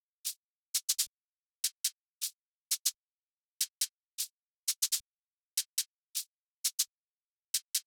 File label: Topline Offbeat Hat, 122bpm; A#min; Construction Kit; Ghosthack; Summer Freebie; Acapella Kit